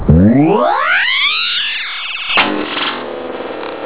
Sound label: laser fire